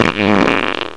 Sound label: fart